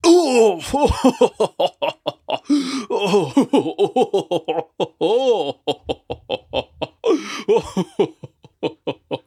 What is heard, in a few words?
stun